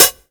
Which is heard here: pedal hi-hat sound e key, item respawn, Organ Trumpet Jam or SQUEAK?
pedal hi-hat sound e key